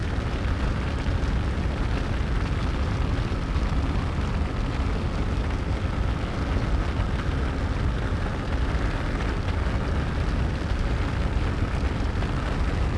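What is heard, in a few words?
fire cruiser loop; rep cruiser; Star Wars Obi-Wan; XBOX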